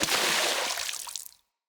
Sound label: Impact Debris Medium Water; Weapon Impacts